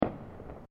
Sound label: Fireworks Single Firecracker; Backgrounds; CraftedSignals